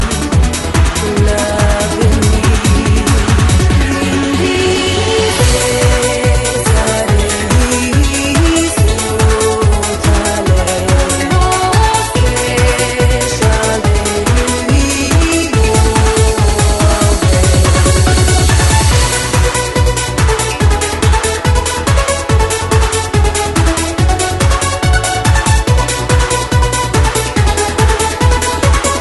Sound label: gladiator trance